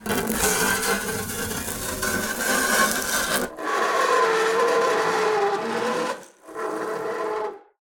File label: small metal box bright creaking tonal dragging 96 kHz; sonniss gdc